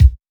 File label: boom kick c sharp key, kick drum; kick drum samples